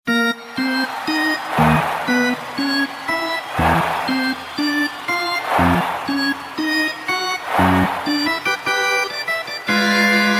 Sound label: stadium music ringtone